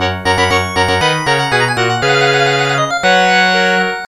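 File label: background music; Legendary Wings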